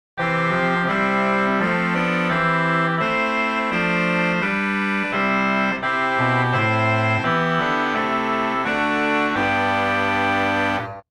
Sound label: Trompette, organ; Romanswiller